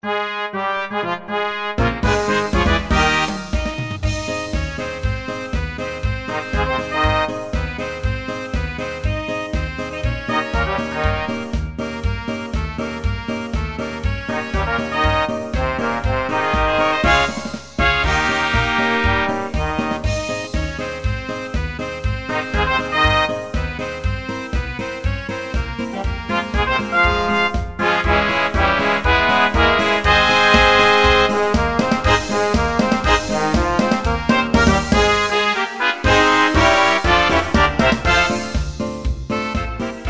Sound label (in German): Marsch Collection